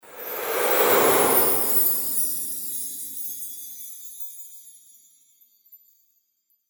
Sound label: CHRISTMAS FAIRY WHOOSH